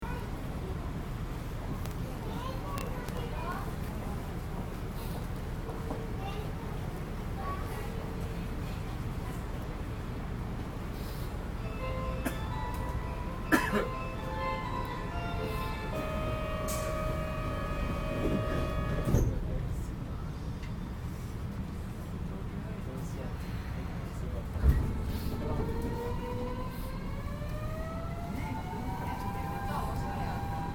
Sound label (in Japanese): 50系, 京都市営地下鉄東西線用の車両です。独特のインバーター音が特徴です; 50系東西線山科〜御陵